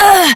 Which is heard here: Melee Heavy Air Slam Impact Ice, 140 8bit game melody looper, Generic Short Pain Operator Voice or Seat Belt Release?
Generic Short Pain Operator Voice